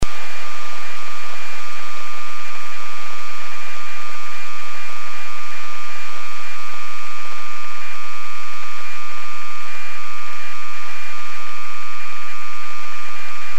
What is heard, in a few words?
beacon, amsat